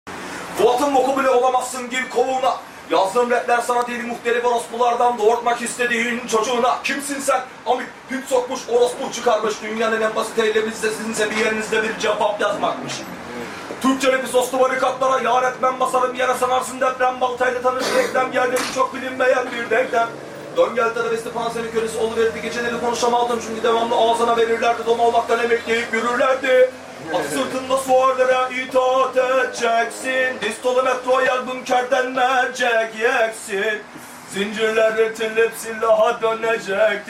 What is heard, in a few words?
acapella